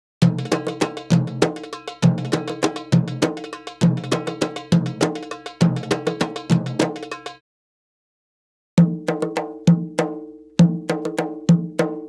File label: Arabic Rhythms